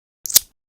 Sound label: coin reveal